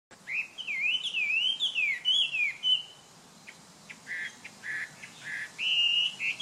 canto do zaragateiro da china

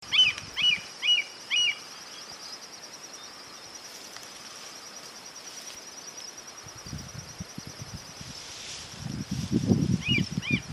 gavião das galápagos

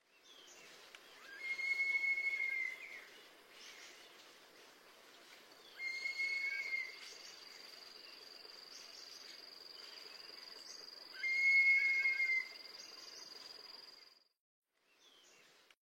Canto da aguia de bonelli

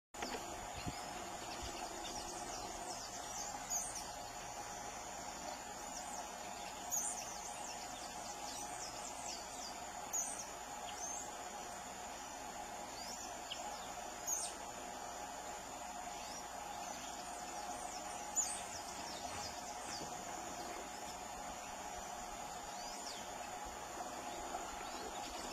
canto do estorninho polinesio

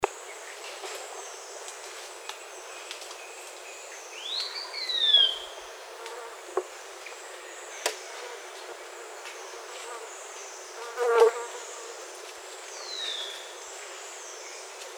canto do rabo de arame

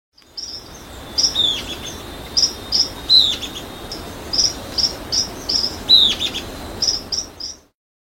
Canto do papa moscas de euler

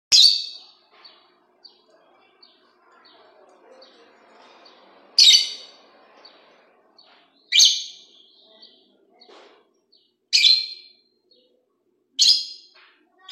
canto do inseparavel de faces rosadas

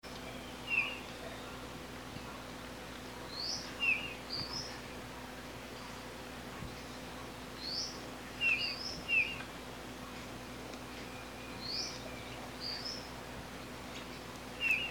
canto do gaturamo rei

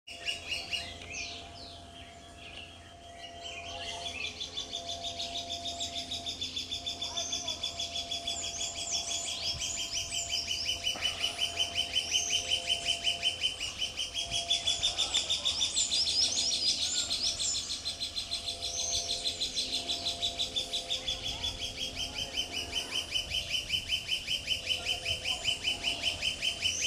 Canto do arapacu grande

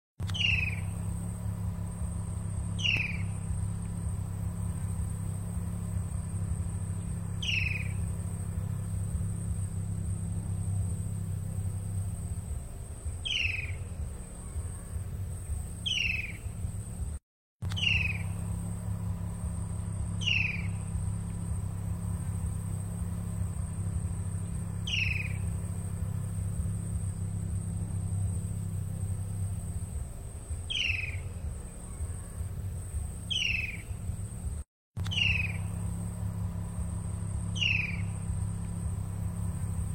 Canto do martim pescador de cabeca preta

